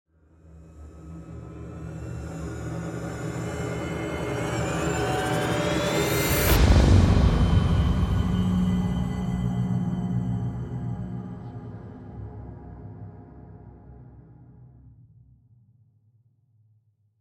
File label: intro sweetener